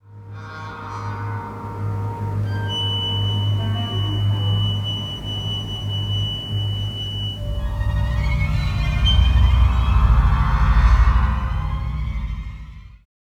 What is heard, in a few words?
Probe Droid Reveal Warning; Hoth Trials